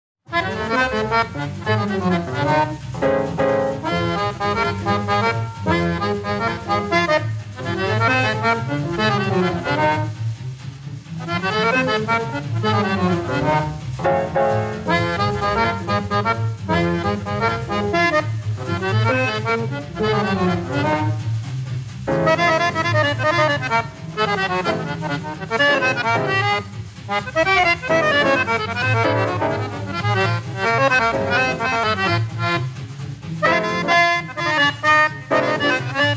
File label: Quintet